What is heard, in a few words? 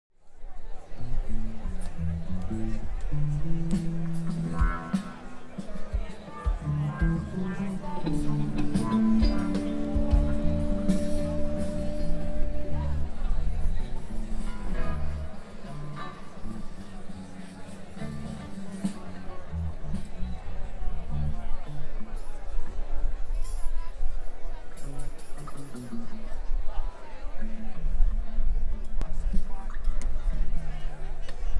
crowd; gefell